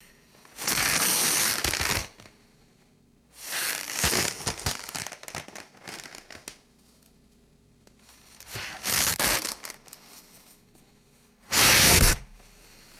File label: light tissue paper crushing and crackling